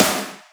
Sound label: Nasty S, Boss DR660 sd